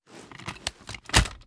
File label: reload end, mg3; tacz; daffas arsenal